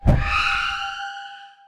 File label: snow ghost die; Clicker Heroes